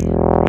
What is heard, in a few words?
Synth Shots; Vengeance Samples